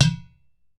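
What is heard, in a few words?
UDU FINGER, African Perc; E-MU Formula 4000 Series Vol. 4; Earth Tones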